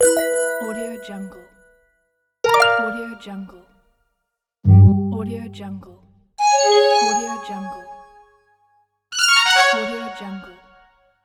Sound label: application menu sfx pack